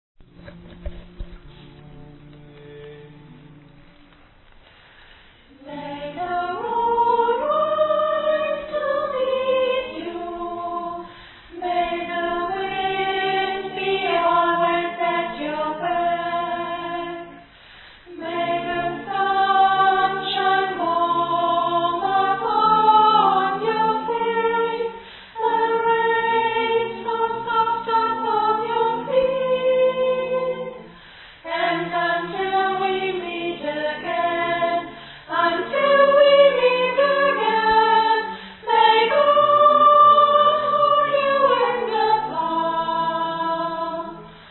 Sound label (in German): Sopran